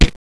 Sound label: THUD